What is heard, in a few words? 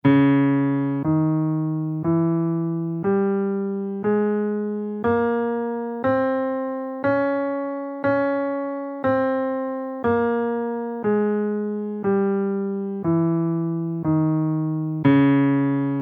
lydian b3 scale in Cm, guitar